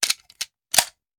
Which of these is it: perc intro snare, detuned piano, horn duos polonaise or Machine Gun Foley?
Machine Gun Foley